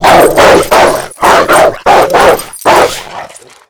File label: animal dog fence; Call of Duty Modern Warfare